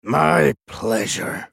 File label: attack; Swain; en US